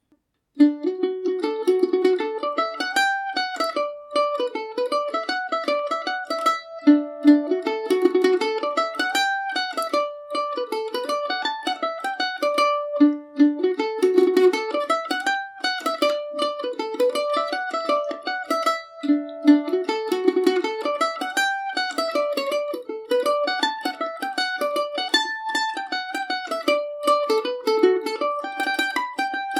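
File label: D major, mandolin; roaring mary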